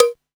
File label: DDD-1 Cabas, drum kit; Polyend; Stazma & Concrete Collage; Concrete-DDD1